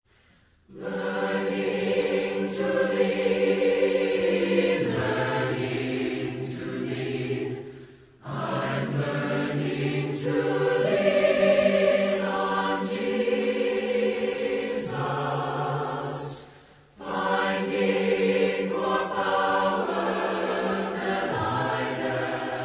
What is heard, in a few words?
a capella hymn